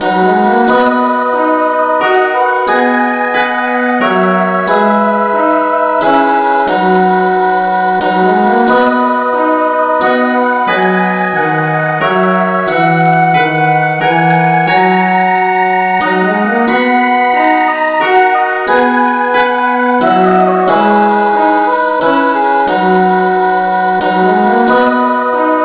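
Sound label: no vocal keyboard, Amazing Grace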